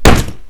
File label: fall big, damage; LumiCu 2.0; minecraft